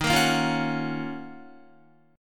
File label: D sharp Minor 9th, Guitar; strummed